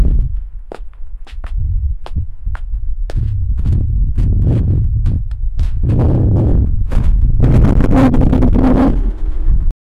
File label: footsteps multiple people